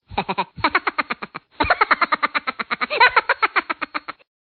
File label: chipmunk cartoon funny laughter sound effects